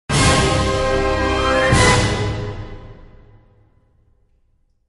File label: battle suspense; Knights of the Old Republic II-The Sith Lords